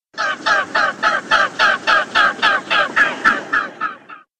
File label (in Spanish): cisne vulgar